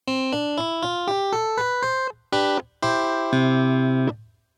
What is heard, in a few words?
Vibanet